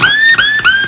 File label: invaders invader hit, arcade; mid invaders